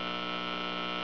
static, radio

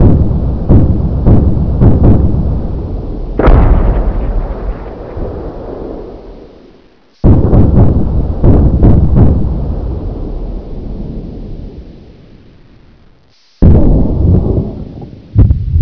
distant fighting outdoors

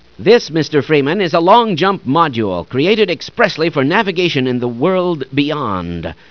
long jump

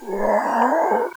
pyro die

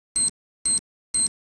c4 beep

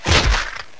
crush

